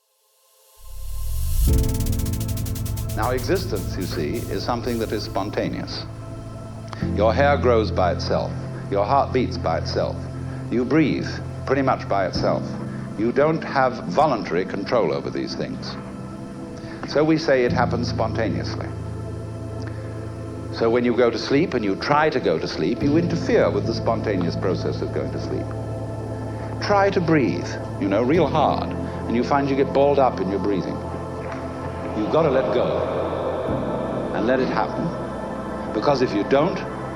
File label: Ambient